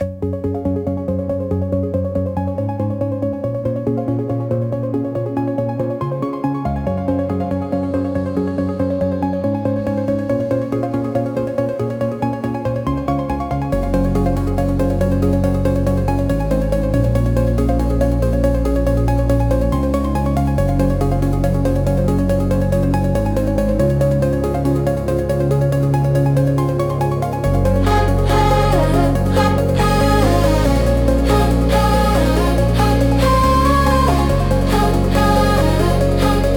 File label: uplifting ambient for study glitchy sfx 140bpm